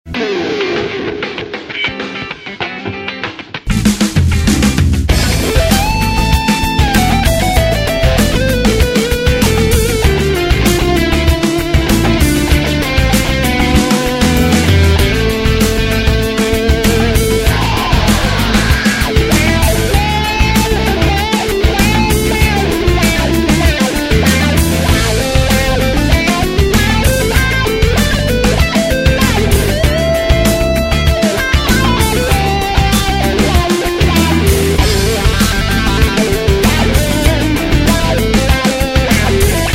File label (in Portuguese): solo da musica